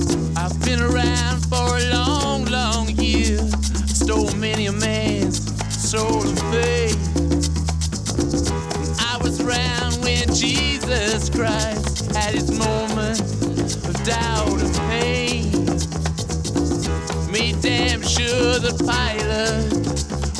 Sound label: rock